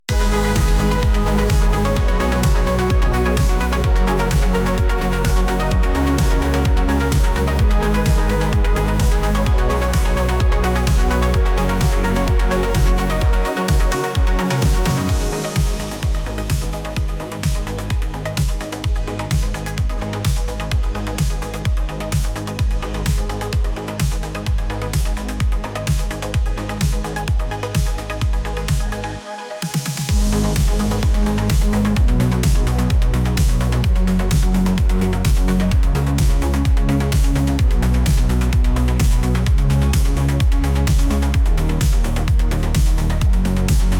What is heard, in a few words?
Neon Dreams Ignite; StockTune